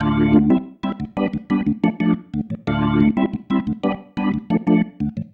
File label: Pattern, Organ